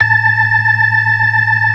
BLOOD OCT R, ORGAN

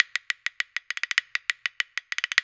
PERCS 100BPM; INIS - Opium

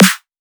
vocodey snare

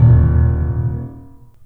THUD, The Piano; InVision Interactive

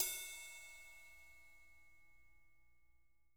rides

